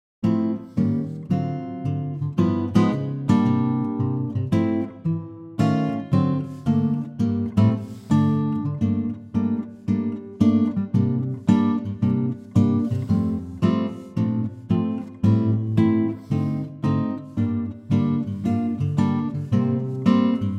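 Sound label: F acoustic guitar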